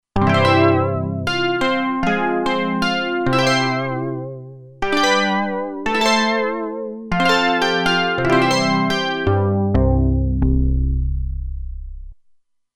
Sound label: Syn Harp, Roland Alpha Juno-2; Factory 2